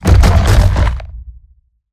Roll Surface Start; Evolve; Behemoth

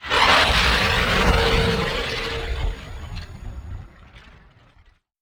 Teleporter Nebula Cloud End; Evolve; Wraith

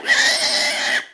boar pain; Giant Boar

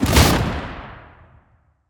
Melee Impact Base; Grounder; Evolve; Kraken

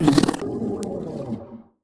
Pain Light; Evolve; Kraken; Grounder3

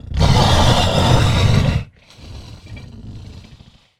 Round End Victory; Evolve; Goliath

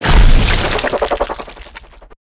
Gooberball Impact; Lurker; Evolve; Gorgon

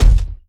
Climb Base; Evolve; Goliath

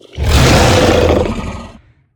Sniff; Evolve; Behemoth

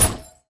Rainbow Shoot; Servants of Necroterra; Goozma

